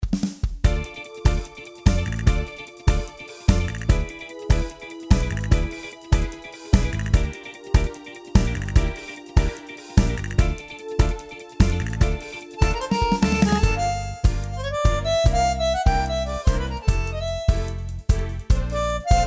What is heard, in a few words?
Tango; AiR Symphony